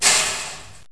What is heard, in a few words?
door chain Link Close